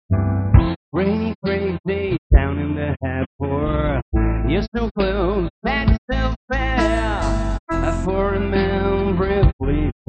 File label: bearlin roads, solo